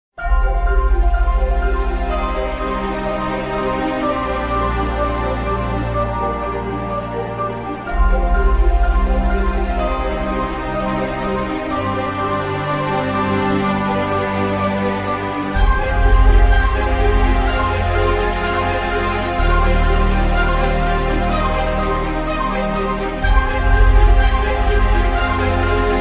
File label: No Vocals